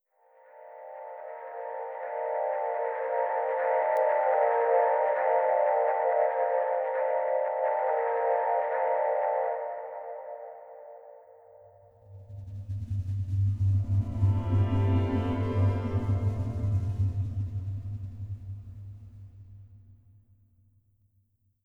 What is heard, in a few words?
The Violin; omori